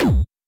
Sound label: No damage hit